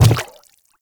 Quadruped Medium Walk Water, Footsteps

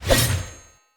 Throwing Knives Throw Heavy

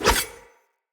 Secondary Dagger Melee Whoosh; Weapons; Player

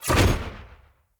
Wolf Claw Melee Swing; Orengall

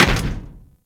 Moa Step Default, Footsteps; Warframe

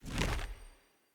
Map Close B

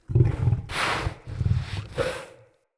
Wolf Idle Trapped Groan; Animals; Vocals

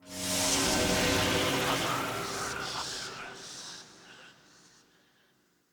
Puzzle Mural Hint; Puzzles; Circade; Puzzle Mural B

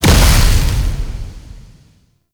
Hazard Pyro Start; Gameplay; Stadium; Warframe; Nineteen Ninety Nine

